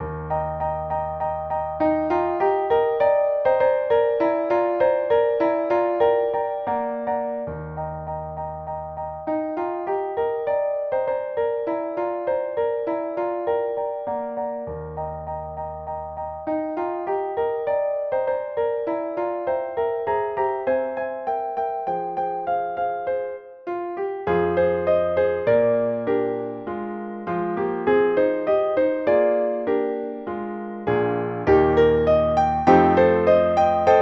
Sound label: Tiny browny bird for piano; synth